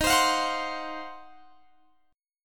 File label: Eb Minor 6th Add 9th Eb down Guitar Standard; strummed